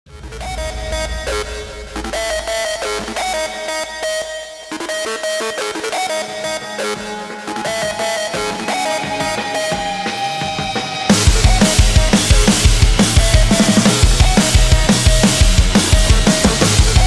drum cover remix

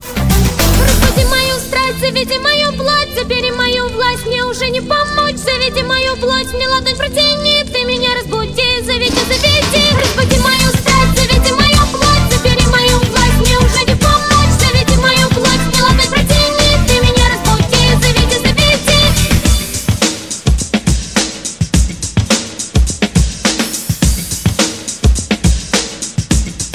duet

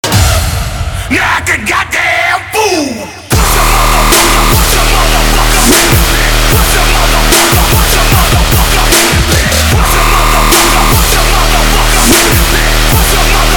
terror bass hard

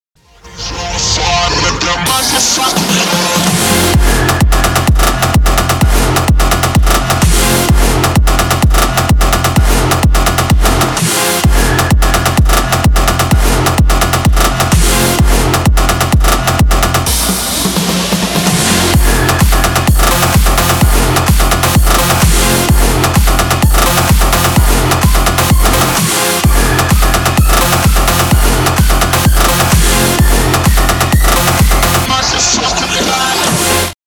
electro progressive; forsazh 8

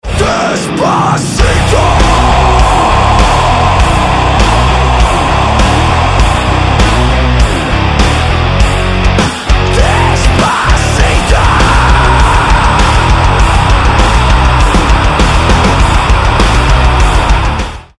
metal cover

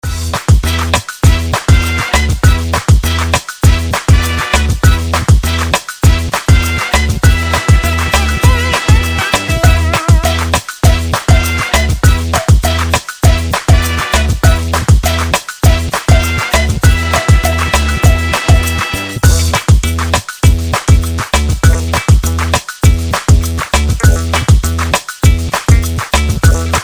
instrumental

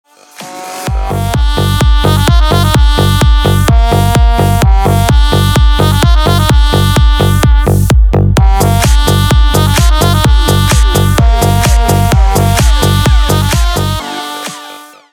trumpet

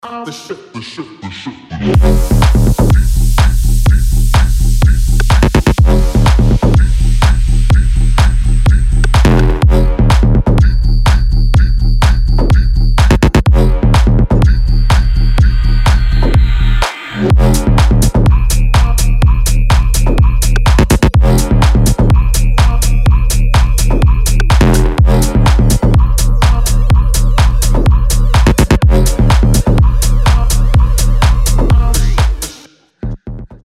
warehouse bass

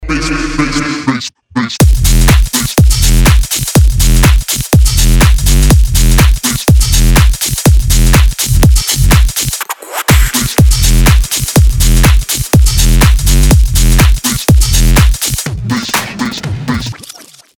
bass bump

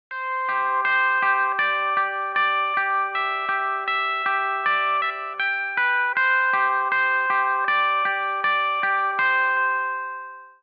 sms; msgale-theme-melodichnyy-zvuk-na-sms-soobschenie

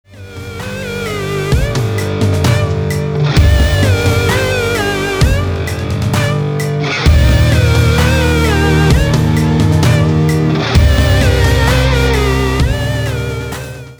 ukrainian music

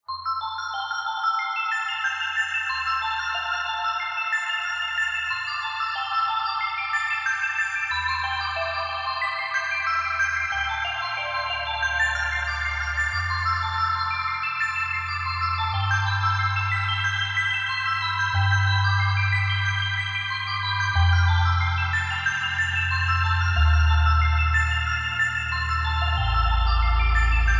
wonderful lounge mix

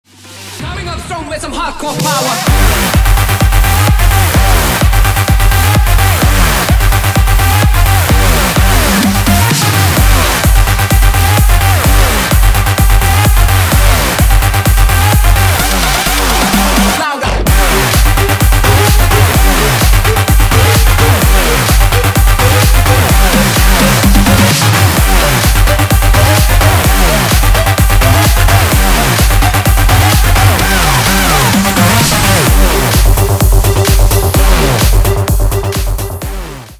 powerbass

